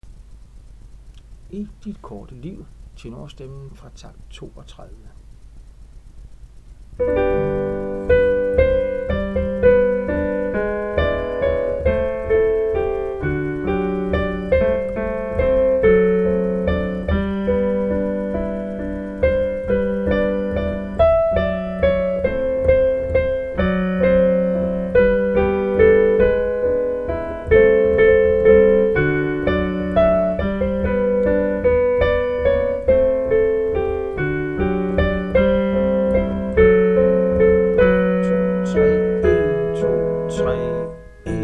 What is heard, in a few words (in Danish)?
tenor